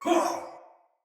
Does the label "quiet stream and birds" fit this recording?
No